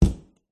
household door cupboard built in close room ambience